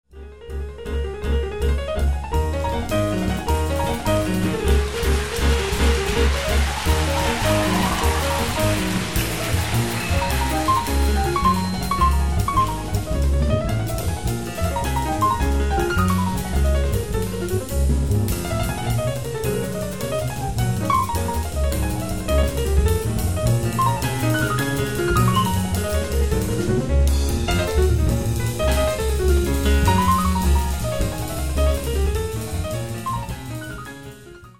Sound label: waltz; Waltz in D flat major; Recorded in Warsaw, 20 April 1995